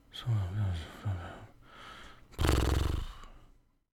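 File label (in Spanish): BALBUCEO RESOPLIDO, babbling snort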